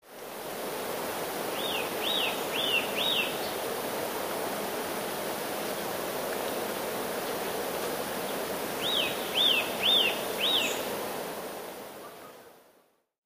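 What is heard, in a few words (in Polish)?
kowalik nawoływania, Tym razem są to świsty, które najpierw lekko rosną, po czym wyraźnie opadają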